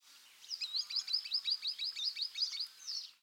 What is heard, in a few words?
Green Sandpiper, Waldwasserläufer